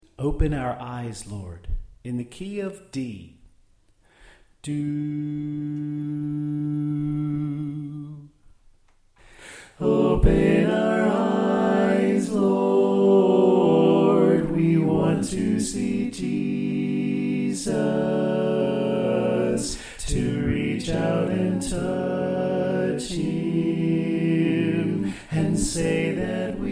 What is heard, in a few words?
Soprano Left